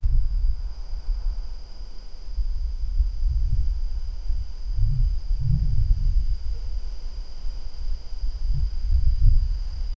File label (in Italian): vento tra gli alberi; anxious